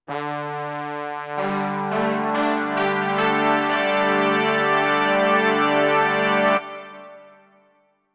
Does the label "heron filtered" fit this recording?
No